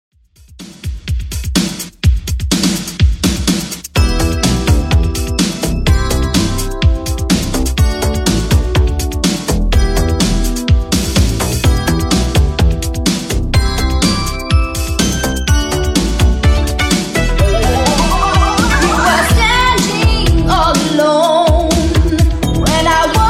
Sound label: 80s Redrum 125bpm